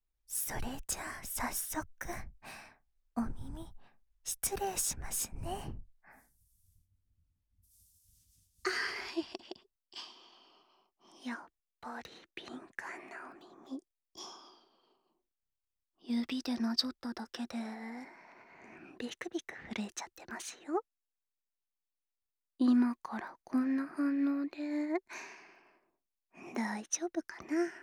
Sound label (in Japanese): さっそく耳舐めご奉仕タイム